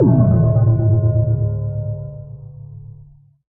deactivate, beacon; block; Minecraft

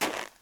snow walk, step; blocks; Minecraft; Remastered

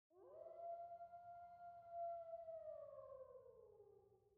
wolf

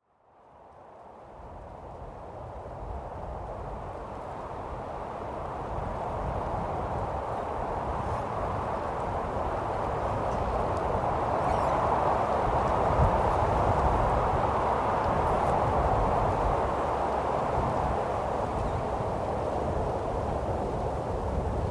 heavy wind

